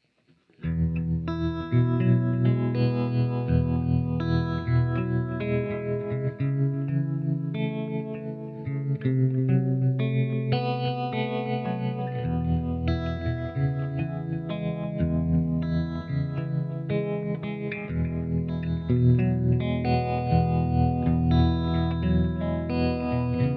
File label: EMT